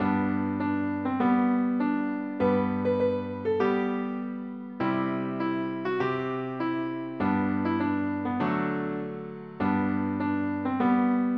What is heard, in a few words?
piano; PSE Music; normal tempo; PlayScore